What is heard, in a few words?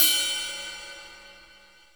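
cymbal, right; Best Service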